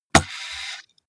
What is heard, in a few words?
computer mouse draw drop hard